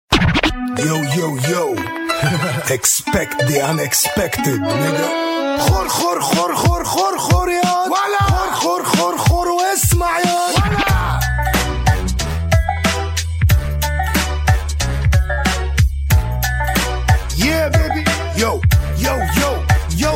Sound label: Khor Khor Yaaah